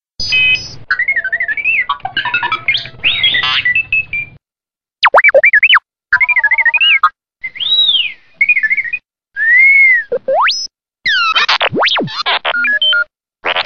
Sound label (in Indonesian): suara robot untuk masteran